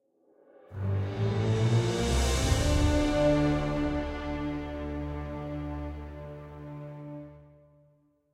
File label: combat defend mountain outro; opal